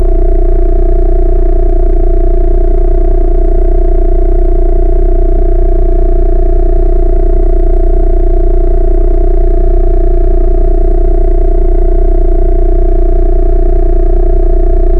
realistic t 34 v 2 diesel; engineering

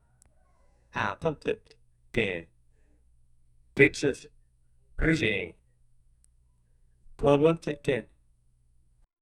high score counting up, tally

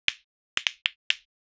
knee joint cracking

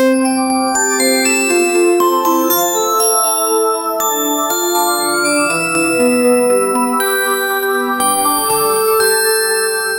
the sound of applying glu, footwear

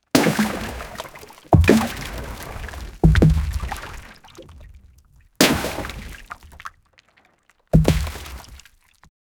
sudden explosive splash, boat; sound effect

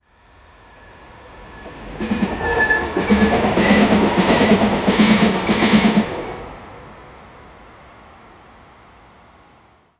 in 1945 a train to, train

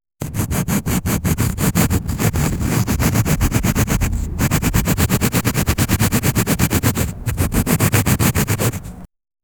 generate a quick record s, comedic

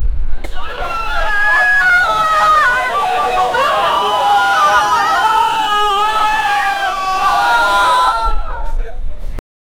humans in panic running, panic

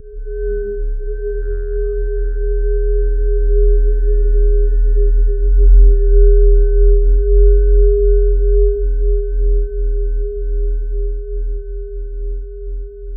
crystal singing; sfx only

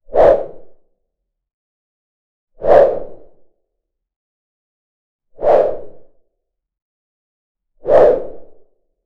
low pitched longsword who

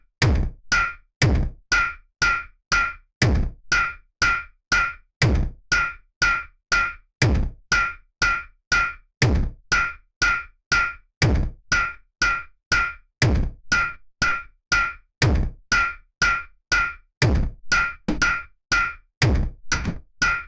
industrial IDM percussion, electronica

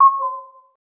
smooth futuristic chill v, vfx

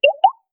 cute dating app notificat; cosy

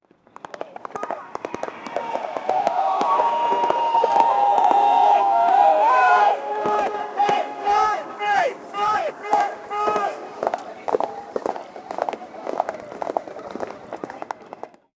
the legions of ancient ro, battle cry

